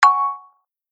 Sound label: notification; google-chrome; Extensions